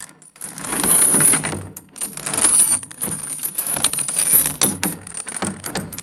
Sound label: heavy metal chain dragging on wood; chains